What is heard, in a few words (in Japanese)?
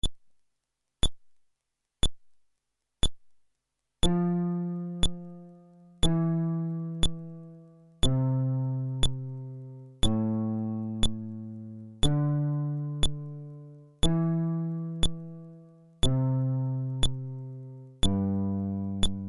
フレットレス